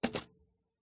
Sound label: typewriter space spacebar; dpa4060; pmsfx